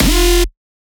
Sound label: Bass Shot F Scream, Dubstep; Ghosthack; Advent Calendar 2018